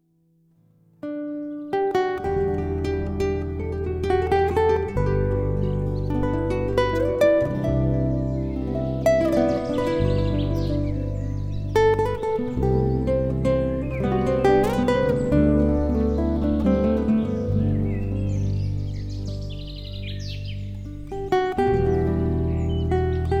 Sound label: home guitar